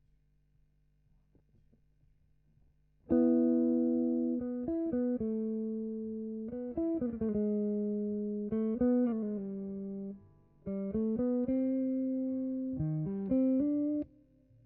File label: GTR B